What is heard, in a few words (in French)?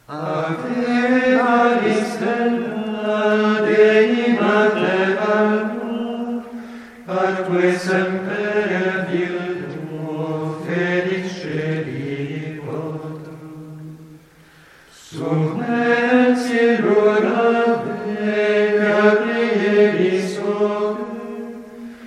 ave, moines bénédictins